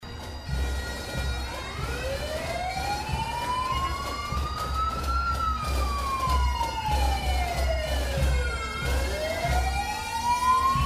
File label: bagpipes